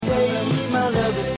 Echo, puis rechantée par un chœur comme en écho